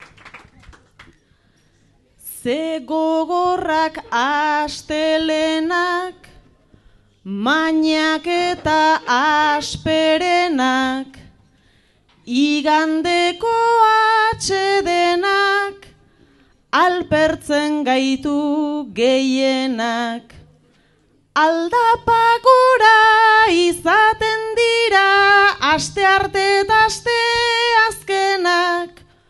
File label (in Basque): agurra, bertsoa; arrate; Arzallus